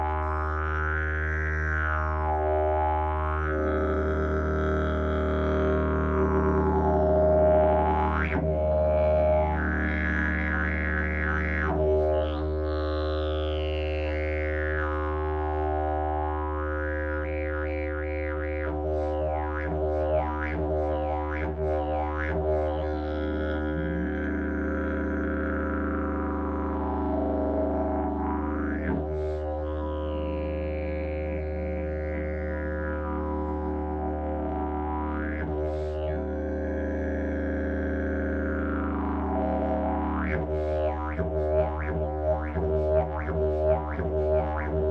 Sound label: didgeridoo, jilm; party